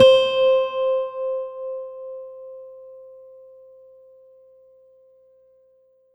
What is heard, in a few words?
Korg N1R Brite Nylon C5